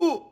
Scream

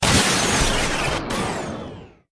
ufo drop

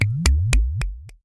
Oil spill

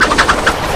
auto attack

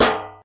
frypan hit

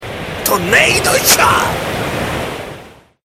tornado

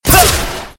golf shot

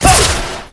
golf shot

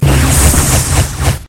power

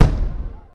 Cannon hit

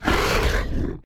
ready vomit

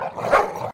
barking

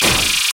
auto down

